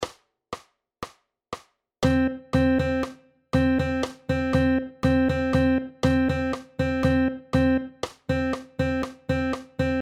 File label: Melodic Rhythm Rhythmus Beispiel